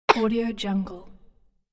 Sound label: very short kid cough; fxprosound